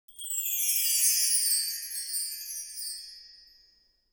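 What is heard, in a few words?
wind chime down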